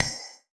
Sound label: Magical Rock Mine